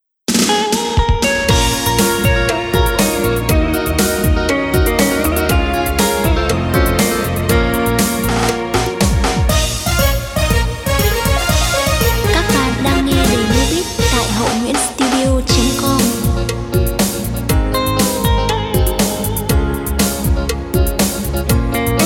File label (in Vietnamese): LK beat